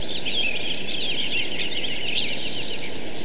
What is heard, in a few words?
Nature Birds; de alliance